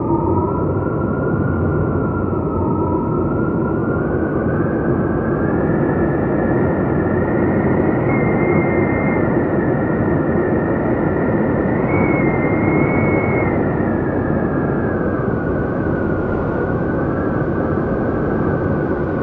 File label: Wind ambience